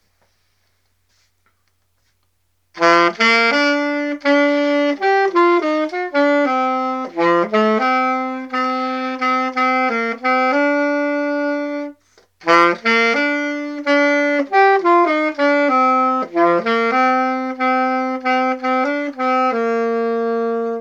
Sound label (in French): asteroide saxo